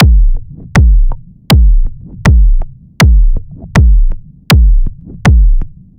Stomp

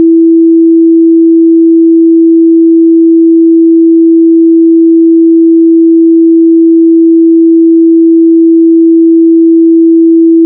Ukulele G 329.628

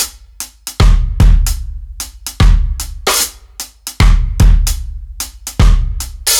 Rimshot Kit; Reggae (Регги)

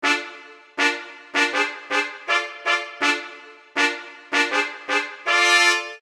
Trumpets

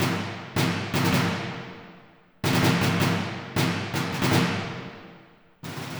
Snare; Сэмплы ударных для фильмов: Snare A; Movie Score; 80BPM; Drums; film music

